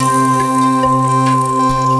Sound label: ATMO SYNTH